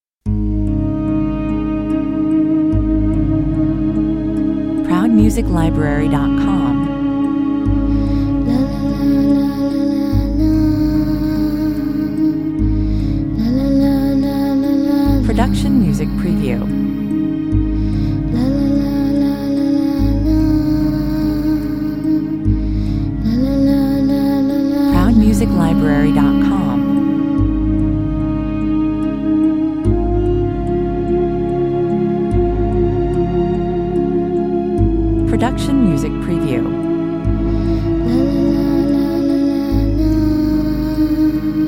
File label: child at night 110 bpm